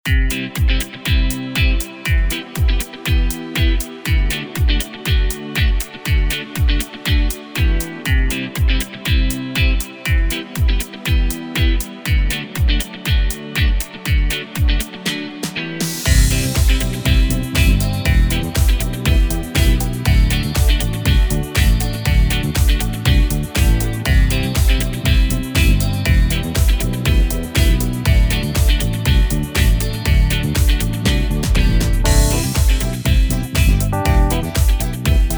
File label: Upbeat